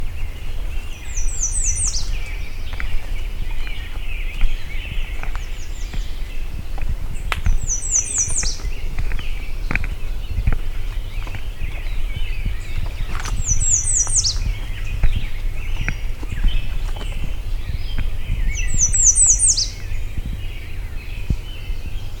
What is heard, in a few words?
Setophaga ruticilla, Michigan Bird Songs; American Redstart